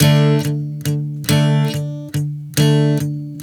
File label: Strum 070 D, One Chord Strumming 070 bpm; Sonic Foundry (Sony Creative Software); Songwriter Acoustic Guitar Companion